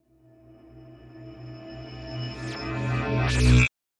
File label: Cyber